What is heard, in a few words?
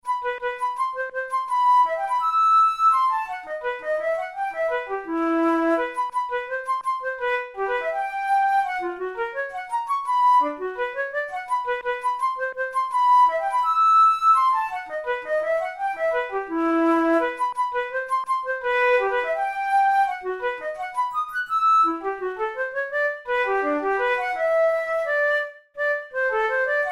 study in e minor, flute; kohler op93 no2 study no7